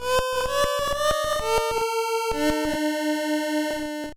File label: Melody ~ Monkey; Mother 1+2; 2003 gba